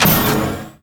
button; doors

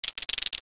boxcutter; dm cardboard

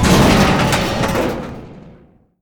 door stop

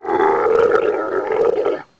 slave pain

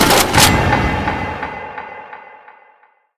ending next level

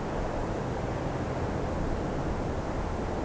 desert wind; de jor1

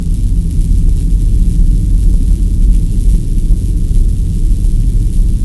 electra idle

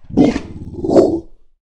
beast alert; morass beta; morassbeast

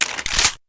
glock clip in left; weapons; kuilu

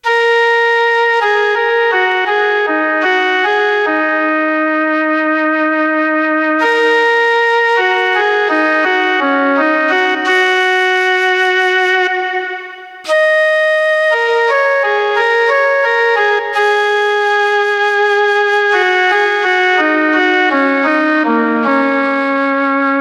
panflute

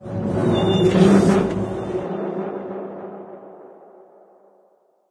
metal creak; subchamber

